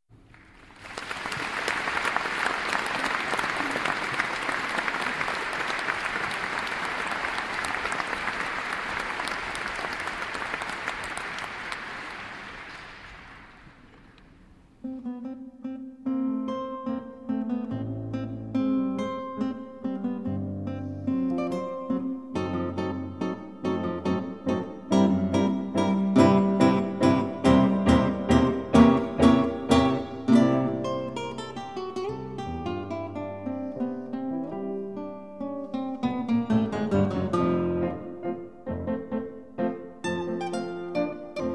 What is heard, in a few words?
mazurka